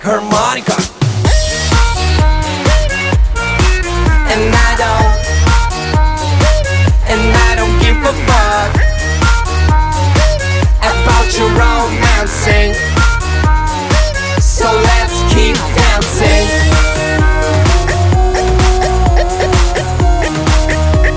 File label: harmonica